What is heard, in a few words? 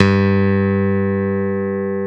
G1 LONG, STIK BASS; D-STIK BASS1